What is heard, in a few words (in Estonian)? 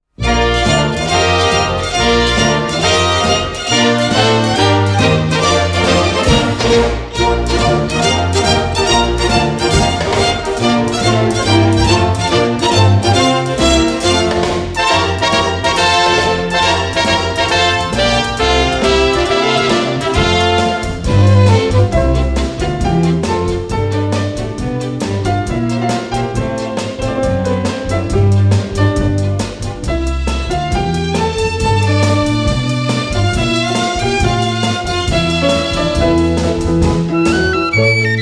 Instrumentaal, Eesti Filmimuusika; Don Juan Tallinnas tiitrid; Eesti Kullafond 2